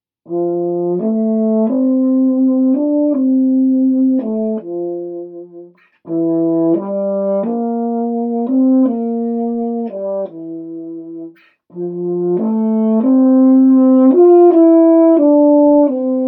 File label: Baritone Horn Band Audition Etudes; 8th Grade All-State